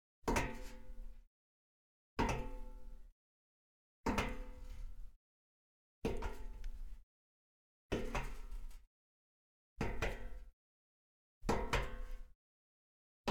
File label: Metal Grate Dampened, Foley Footsteps; Sound Ideas